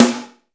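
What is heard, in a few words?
WOOD snare; East West - Phat & Phunky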